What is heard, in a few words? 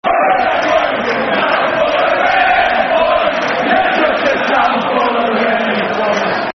Town Full Of Rentboys, Football Chants; Middlesbrough; Middlesbrough Mfc